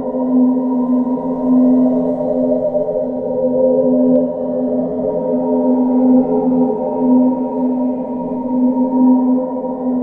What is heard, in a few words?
SFX Loop Ghost